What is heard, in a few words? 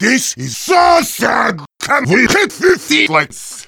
heavy sad; tf; noisemakers